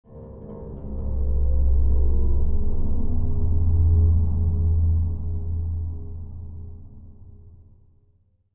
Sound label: Ominous Stinger